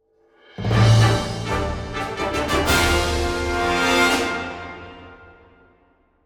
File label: Transition